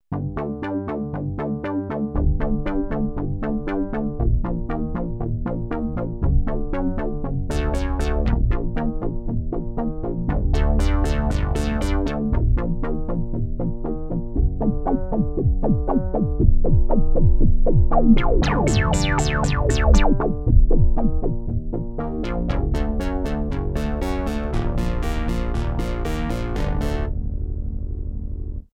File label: seq, redsound darkstar; Sequence (another)